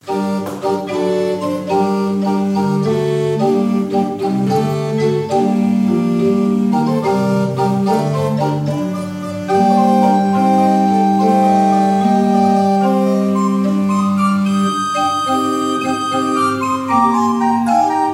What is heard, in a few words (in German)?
Oehms 6 II P, orgel